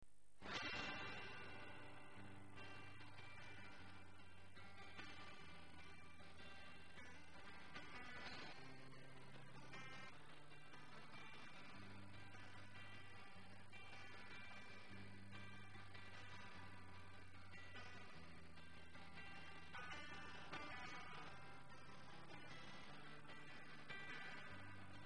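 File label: Marshall 6101 channel 1 clean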